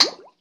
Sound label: Bubble Burst; Pistol Shrimp